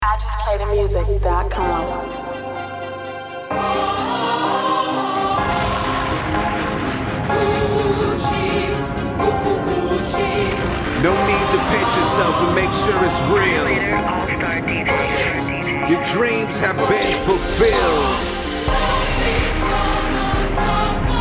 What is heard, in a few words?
Classical